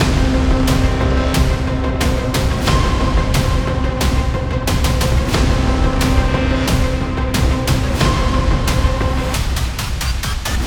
Library Trailer

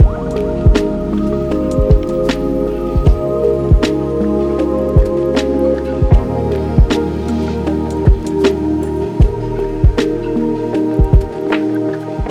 Lofi

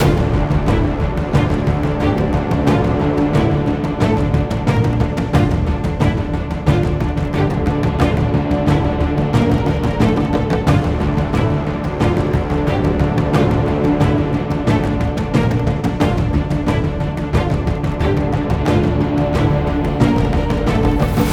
Library Percussive

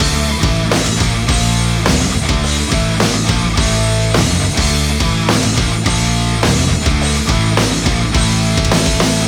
Metal

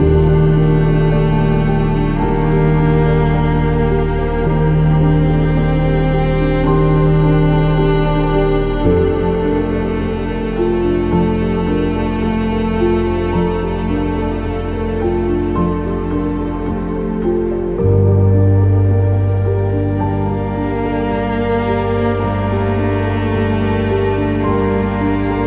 Film

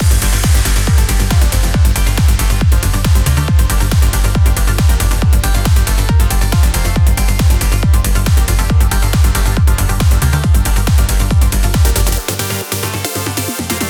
Trance

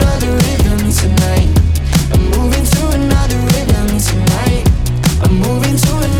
Pop

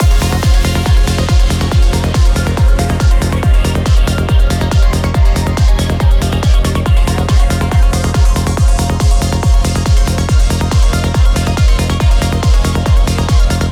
Trance

